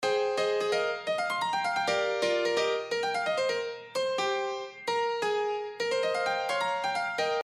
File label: Piano